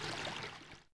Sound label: Water Move; Civ4 warlords; Resouces and Improvements